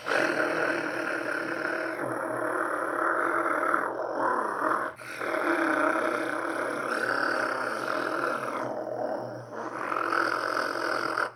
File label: child sing; bone hunter; Hollow Knight: Silksong